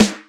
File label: clear snare drum g sharp key